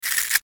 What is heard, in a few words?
Transition Reverse Crinkle; Whoosh Digital Descend; Multimedia; Blastwave